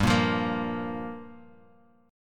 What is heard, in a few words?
G Minor 7th; Gm7; strummed; JGuitar; 15,13,15,15